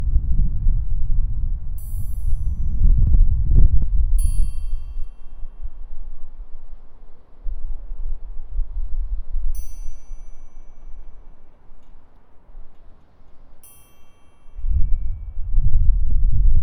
바람과 트라이앵글; 동민

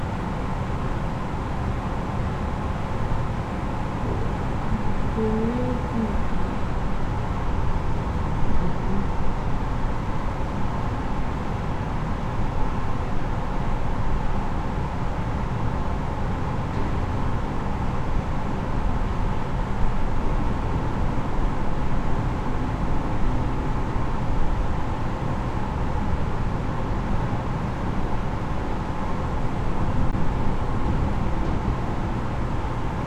환풍기

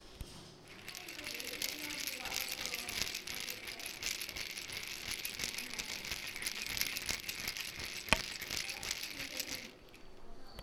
체인

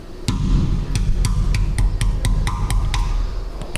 넘어짐

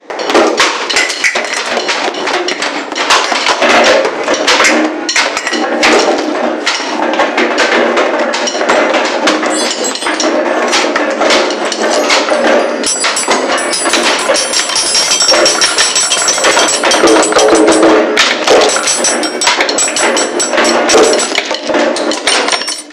우당탕탕; 별꼴

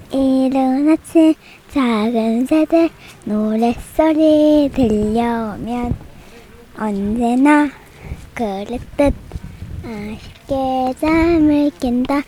노래

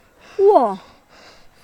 우와

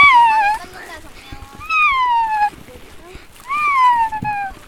동물소리흉내

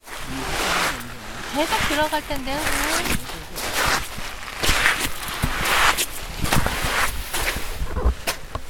모래 걸음; beach

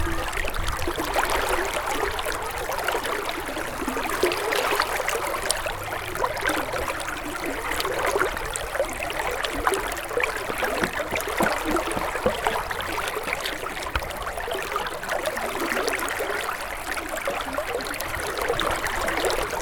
귀요미 물소리, beach

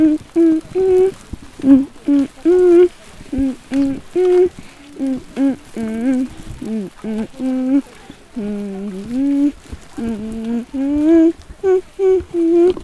가을 아침

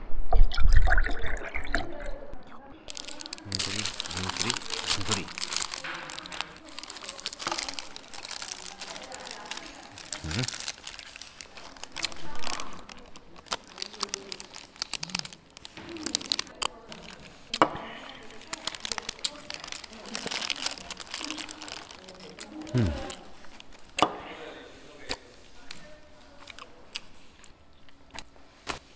먹고 마시는 소리

